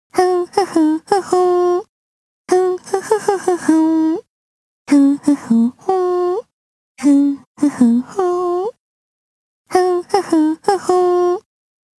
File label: Sing Love' (Only Voice; Black Rock Shooter The Game; psp